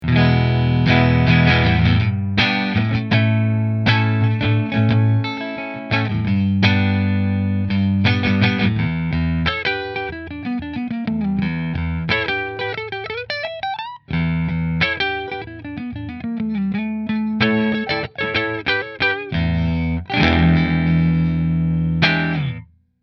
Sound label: Nola Voodoo Quilt Neck Fender